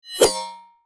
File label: metal whoosh hit; Aqua